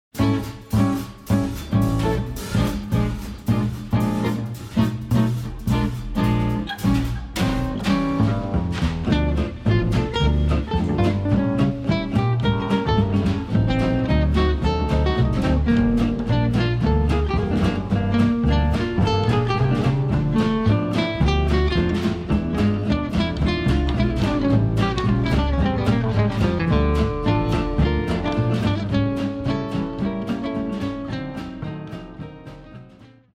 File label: Swing